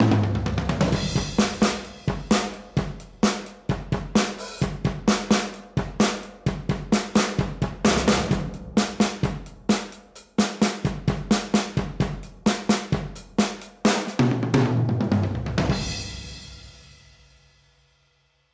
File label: 60s rock